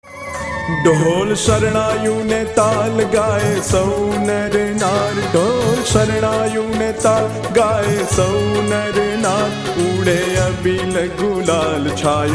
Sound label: Dhol; Abil Galal Lalji No Jay Jay Kar; Swaminarayan